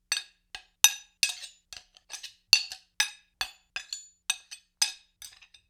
Axe Spear Blade on Blade, Weapon on Weapon; StillNorthMedia

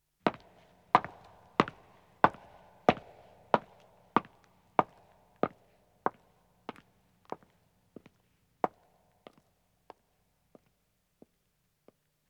Stone Walking, 1 Man Departs, Footsteps; BBC Sound Effects Library; Footsteps II

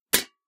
plates hit

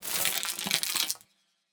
Spectral, Designed Plastic; Gregor Quendel

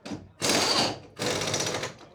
Porsche 911 GT3 Garage Air Wrench Wide; AUTO RACE; ec fields

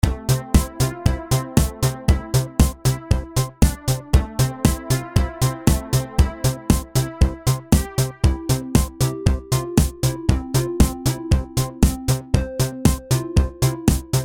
Techno Bump

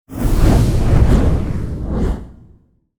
Elemental - Powder Movement; SoundMorph

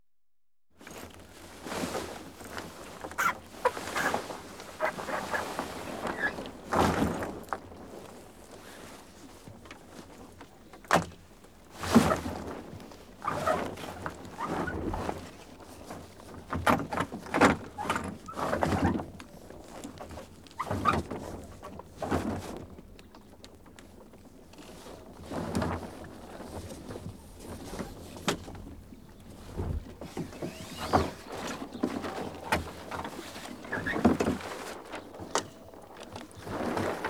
Sailing Dinghy, Hauling Up Sail; Ships And Boats; BBC Sound Effects Library; Ships And Boats 2

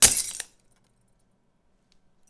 Glass; FXHome

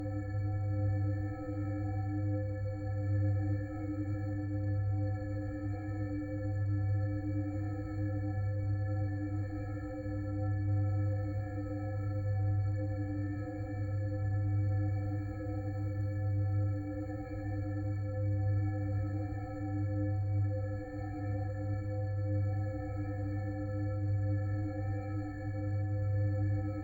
Space Ambiences; Drones & Atmospheres; Fox Audio Post-Production

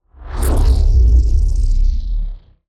Just Whoosh; SoundBits

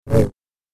Double Bladed Saber Sound; FXHome

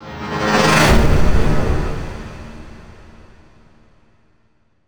Transition Sci-fi; MatiasMacSD; DISTORTED PERCEPTION

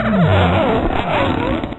SQUEAK Stretch Tighten Long; GameBurp; 2000 Game SFX

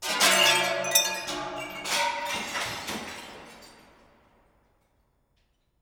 Buildings Rumble Elevator Shaft Glass Break

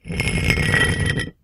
stone Drag; Kenney's Sound Pack; Foley Sounds; Rocks

